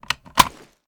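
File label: reload magazine out; gmod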